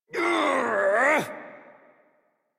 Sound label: Exert Adult, Melee; Warframe; Duviri; Drifter; en